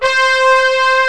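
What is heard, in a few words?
C5 UNISON BRASS; ORCH.COMP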